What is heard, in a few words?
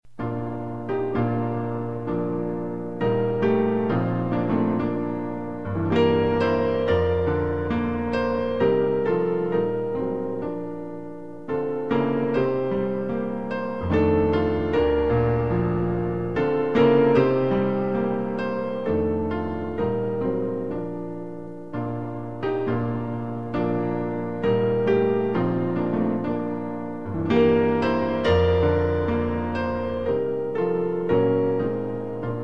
Playing Piano; Rock of Ages